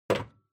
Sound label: Power Strip Put